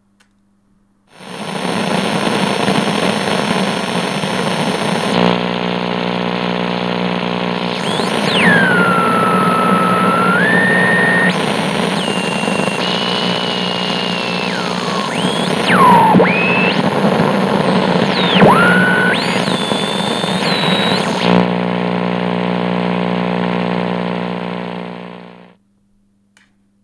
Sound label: Creepy Radio Sound Interferences Mix